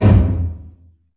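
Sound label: large room 909, reverb; TR 909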